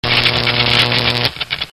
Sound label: pain; hunter